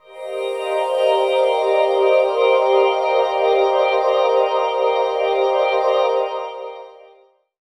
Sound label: Bell Shimmer, Pads; Jungle Warfare 1&2